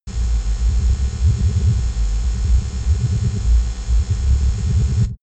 space alien machinery

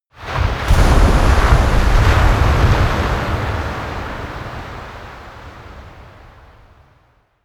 coast oneshot surf strong

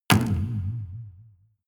spring

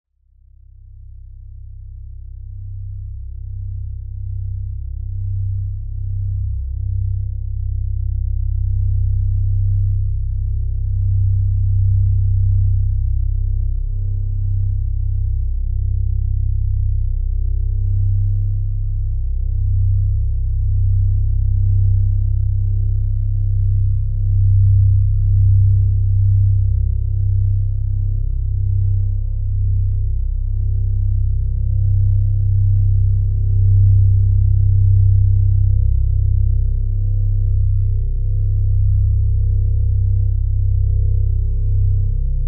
subtone neutral

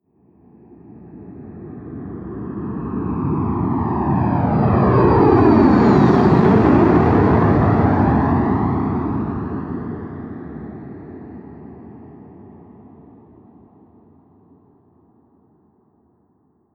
military jet bomber flyby whoosh

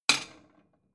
plate small shelve hard

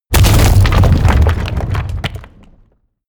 break stone large

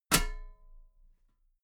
metal ladder step heavy

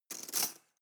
cutlery teaspoon drawer grab hard

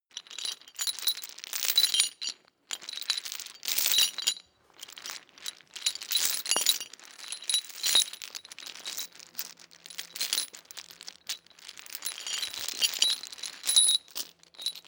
metal chain movement

